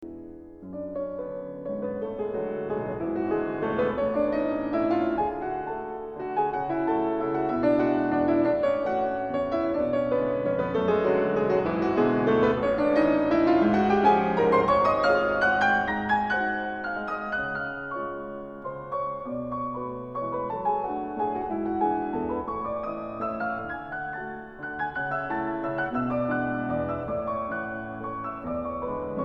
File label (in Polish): Mazurek